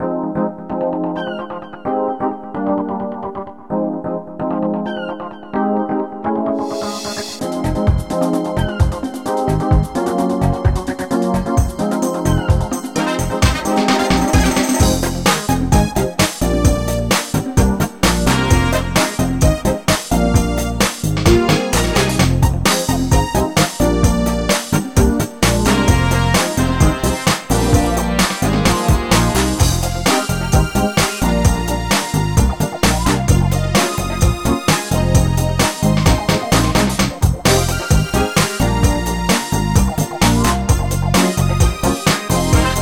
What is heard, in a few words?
Dance